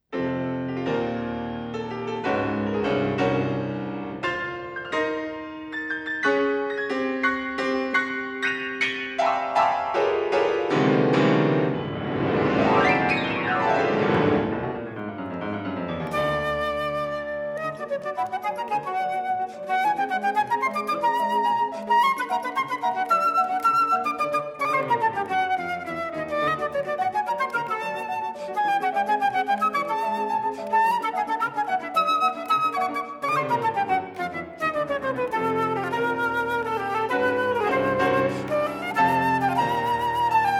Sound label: Sonata for flute and piano